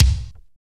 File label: KIK, Kicks; AMG; Now CD-ROM (Roland); NOW! Drums